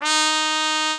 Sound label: mexican trumpet